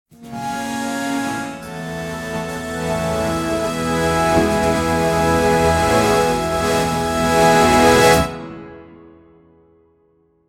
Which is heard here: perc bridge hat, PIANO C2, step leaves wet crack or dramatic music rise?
dramatic music rise